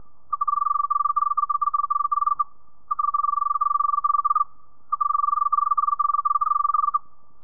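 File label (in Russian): сверчок близкий мягкий монотонный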